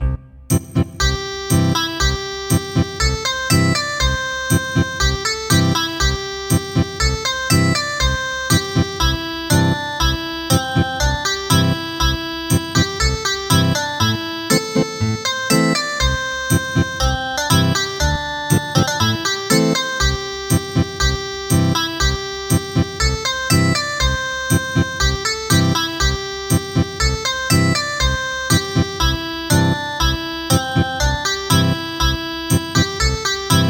Ethnic Guitar; Candle Dance

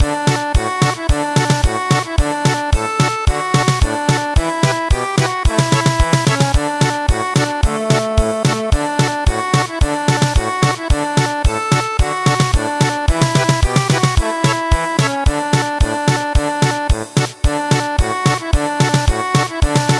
Village dance German Polka; traditional; gangar

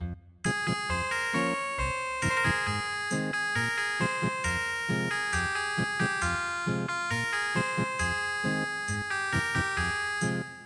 Ethnic Guitar; You Are Spring Flower

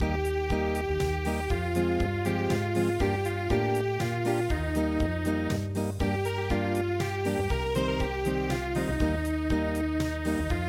Unplugged, midi; nam po ta ryeong

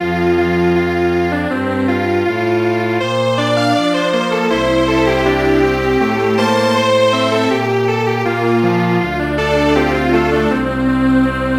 Strings Quartet

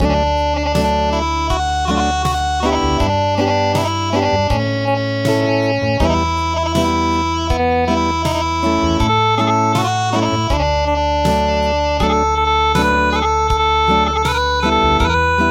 Acoustic

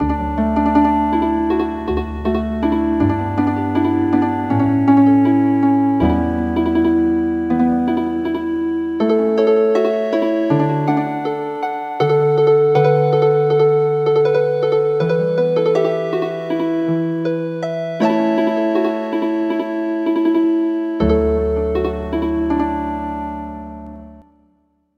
Healin Harp

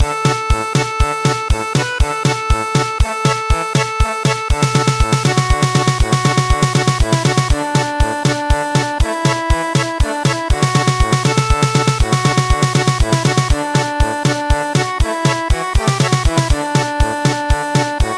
German Polka; traditional; Hambani kahle

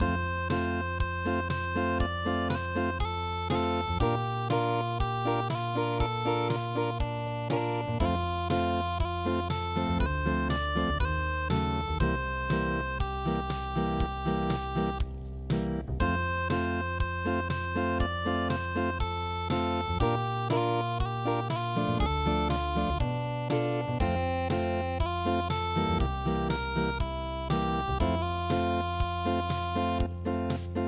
samoa unpluged

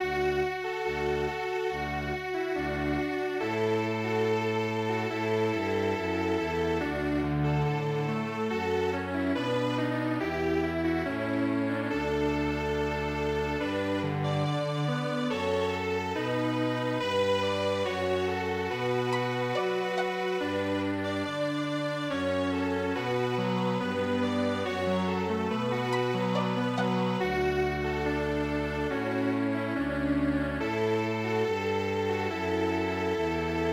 strings

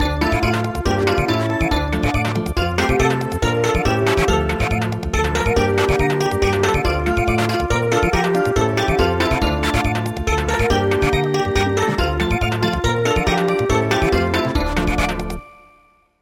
Samba; A new joy has been revealed

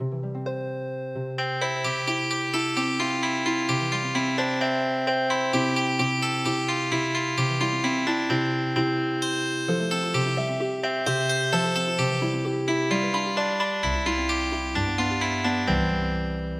Healing Harp, traditional; Sarasponda

